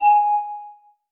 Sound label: photon emit uv; molecules and light; greenhouse effect